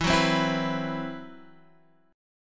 C Major 7th Flat 5th E; CM7b5/E; strummed; JGuitar

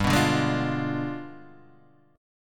D Diminished 7th G

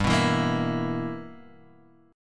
B Major 7th Suspended 4th Sharp 5th G

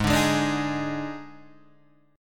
Eb Major 7th Suspended 4th; EbM7sus4/Ab strummed

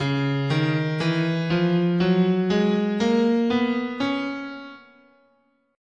C sharp Bebop Minor, scale

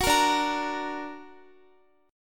D Augmented D down Guitar; D+ strummed; tuning 4E,4A,5D,5G,5C,6F

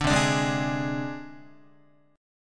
C Suspended 2nd Sharp 5th C Guitar; Csus2#5 strummed; JGuitar

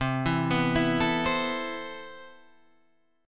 C Major 13th, Guitar Open G, chord

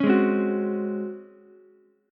Db Major 7th Suspended 4th Db down Ukulele; strummed; JGuitar

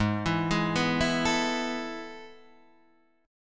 A Major 7th Suspended 2nd

